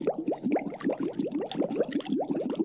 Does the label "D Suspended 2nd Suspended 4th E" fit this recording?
No